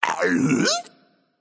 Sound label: confused, voicepack; smite; jorm